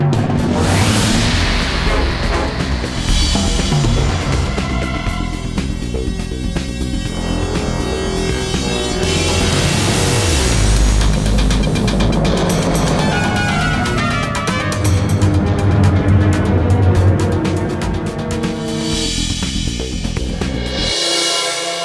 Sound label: Fight loop; Ben 10 Protector of Earth; Wii